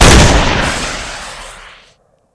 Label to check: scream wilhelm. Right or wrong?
Wrong